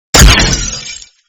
shield cracked; fortnite

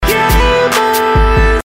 pop song

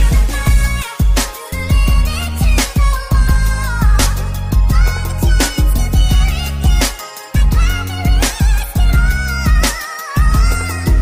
instrumental remake

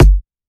kick

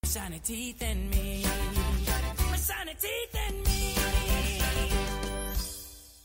dubstep

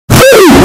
oof

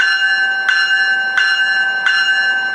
bell loop

